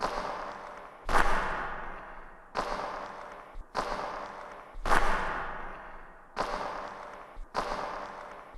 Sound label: footsteps; solicorecrystal; Code7